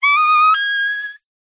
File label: leisure inflated rubber pool toy rub ground squeak